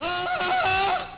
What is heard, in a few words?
ayaah